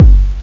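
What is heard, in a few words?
bass drum; BAS PERC; eXtended Instrument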